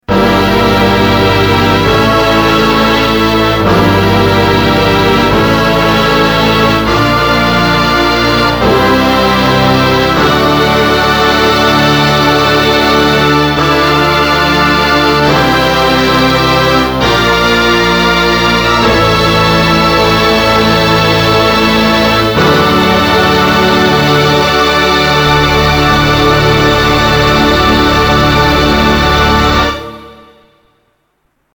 orchestra

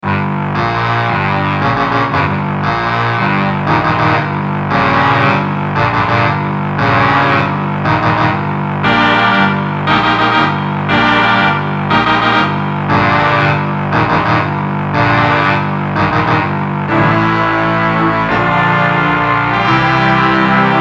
Half Speed Brass; CRIMSON

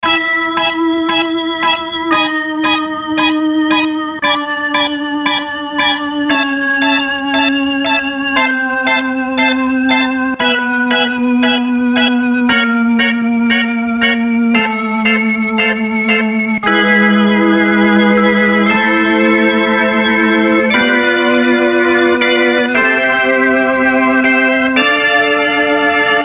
leslie organ

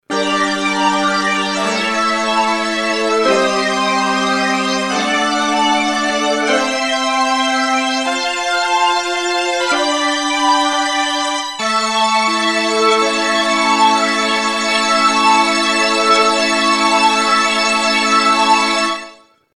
eminent 310 strings 4 phased

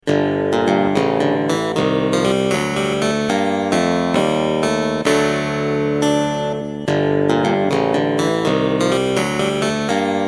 clavinet d6

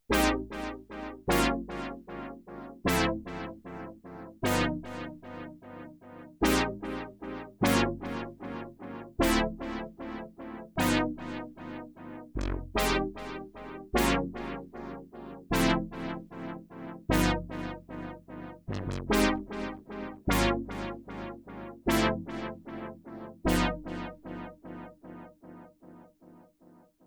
BRASS

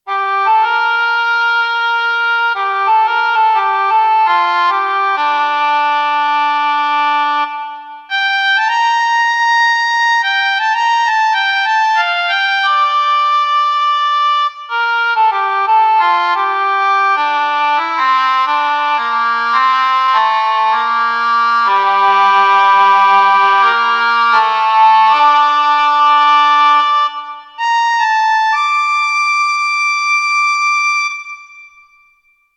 Cor Anglais

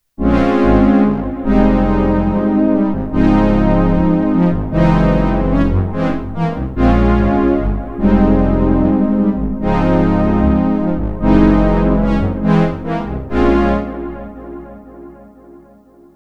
STRINGS; JUPITER

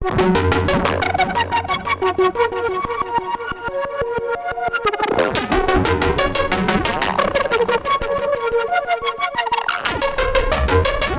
Seagulls Arp; TEO-5

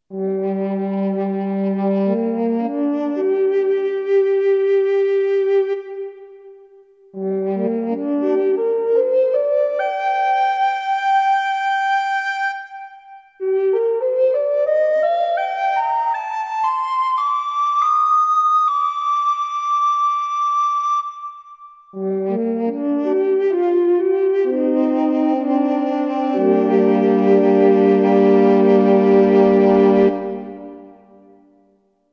Recorder

